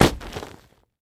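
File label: Heavy Bag Hit, boxing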